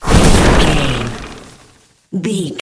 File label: nature spell vines storm